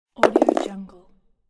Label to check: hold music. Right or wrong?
Wrong